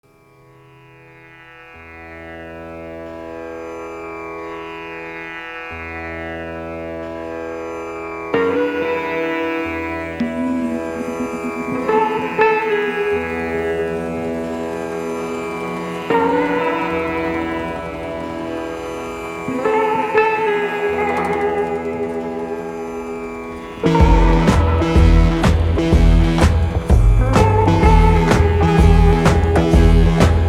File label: classic chill guitar; India sfx; for nymphs y Companions